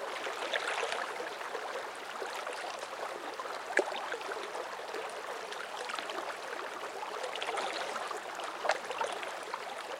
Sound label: lake water; 左高地